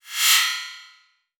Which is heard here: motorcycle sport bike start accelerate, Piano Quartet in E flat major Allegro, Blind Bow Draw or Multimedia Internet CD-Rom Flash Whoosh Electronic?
Multimedia Internet CD-Rom Flash Whoosh Electronic